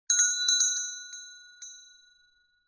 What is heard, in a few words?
bell ringing